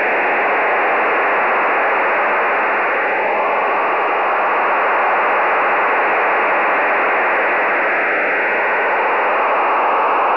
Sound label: USAF 2400 Bd (SERIAL); (MIL-STD 188-110A compatible); USAF coded